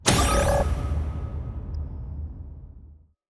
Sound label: Menu Button Start